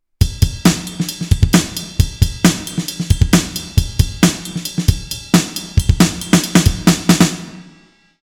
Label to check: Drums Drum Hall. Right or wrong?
Right